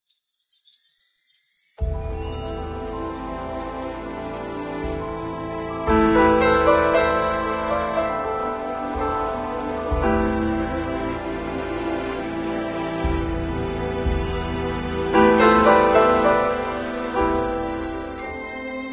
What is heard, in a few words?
Stripped Menu Cue; Abra Academy 2 Returning Cast